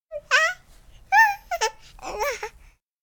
baby laugh; anemoi

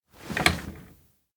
pull chair counter; anemoi

